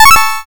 win

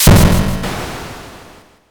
thunder magic

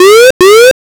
warning

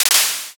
clap

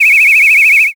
whistle long

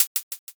hihat

